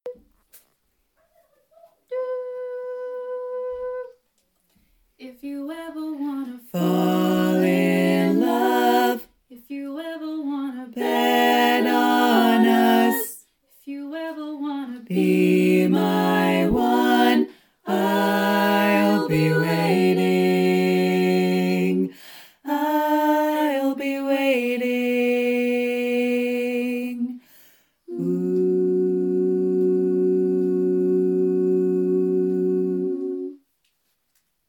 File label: Bass